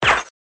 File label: Silencer